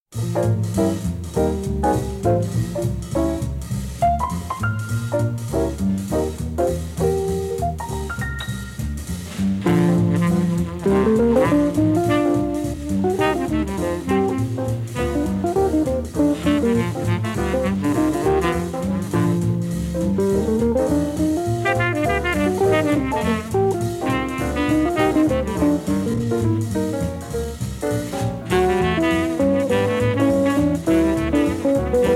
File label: Swing